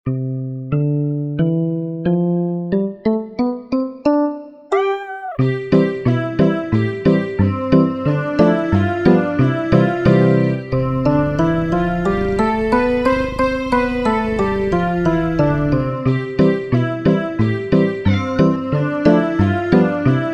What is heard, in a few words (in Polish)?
akompaniament